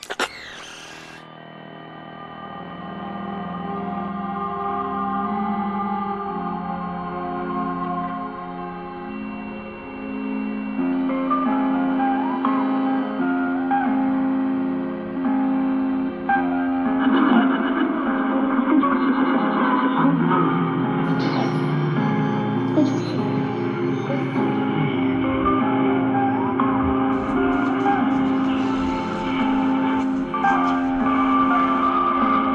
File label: Radio On-Guitar Theme